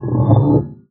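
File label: glissando, special fx; Xsample VOL-1; 90 s Sample CDs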